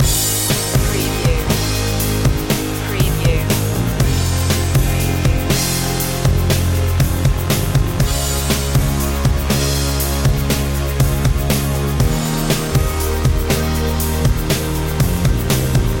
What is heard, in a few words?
starstruck loop